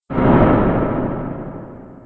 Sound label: fail